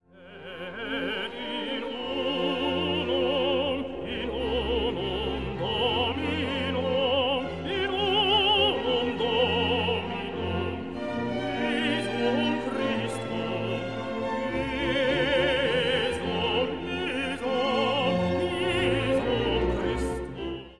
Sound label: Et in unum Dominum solo